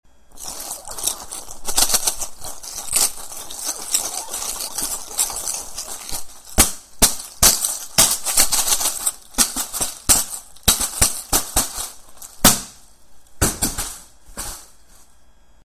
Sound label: rattle soccer ball